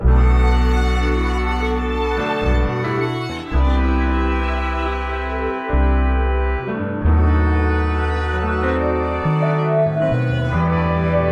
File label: Antique 137 BPM B Min, Melodies; Cymatics - Immortal Production Suite Beta